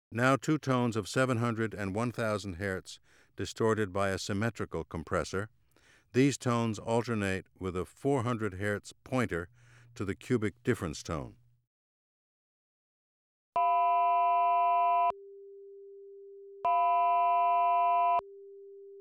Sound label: Distortion